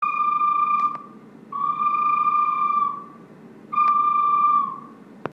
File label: kingfisher